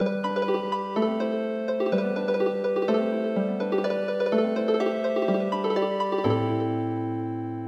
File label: Healing Harp